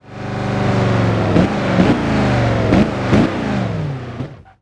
throttle off, vehicles; gmod; tdmcars; raptor svt

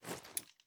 first raise start; gmod

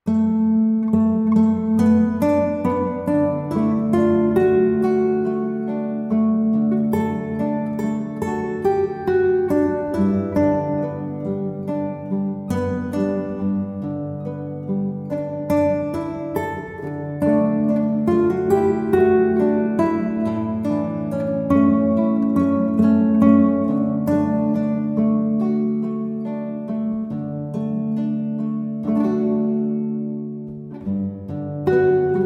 Acoustic

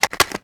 reload empty magin; viper

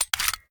reload empty xmag magout; gmod

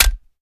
reload magin; gmod

